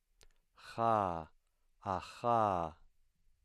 voiceless velar fricative

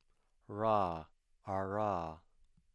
voiced retroflex approximant

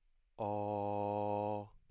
mid-low back rounded vowel

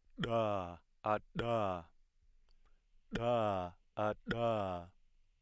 voiced alveolar implosive

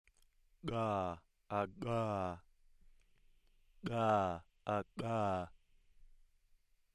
voiced velar implosive

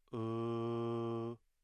mid-high central rounded vowel

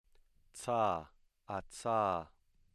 voiceless alveolar affricate

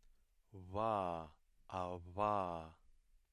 voiced bilabial fricative

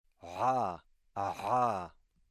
voiced epiglottal fricative

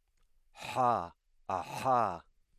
voiceless epiglottal fricative

